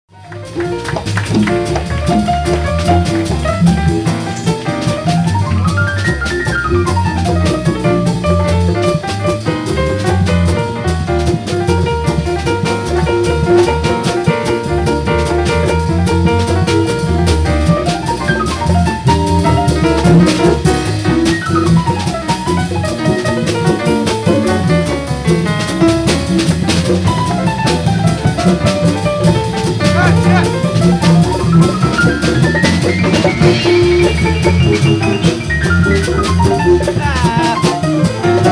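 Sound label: Piano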